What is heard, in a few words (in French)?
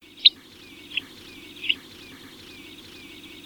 bruant ortolan